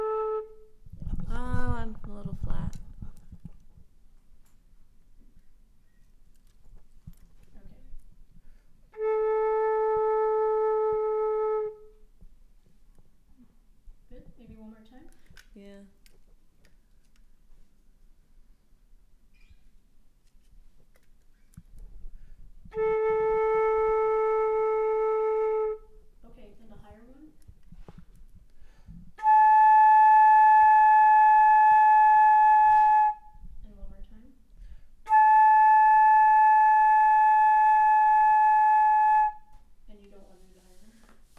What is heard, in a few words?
flute